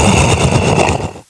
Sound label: Deer Fear